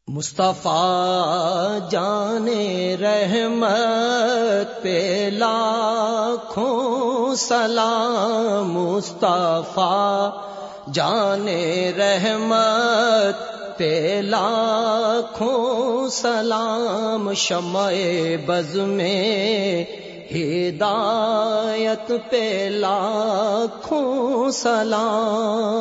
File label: Salam Ringtone